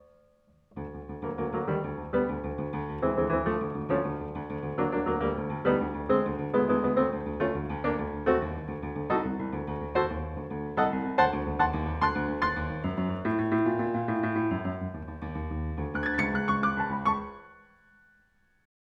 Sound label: Broadwood1908; Liszt Gnomenreigen